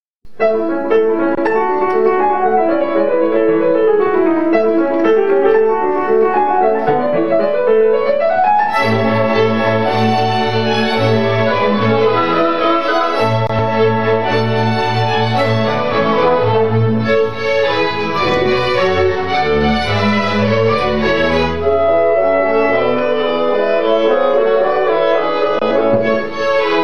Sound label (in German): Klavierkonzert, 3. Satz KV 488; Mozart